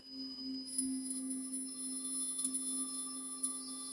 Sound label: notification